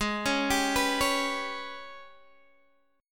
G sharp Minor Major 7th Double Flat 5th, Guitar Open G; x,13,11,12,12,11